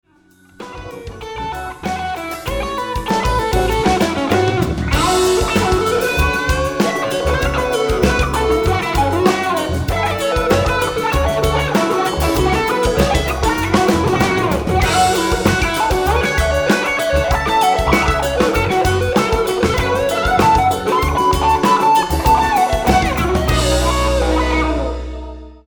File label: guitar solo